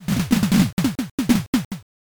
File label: steps crowd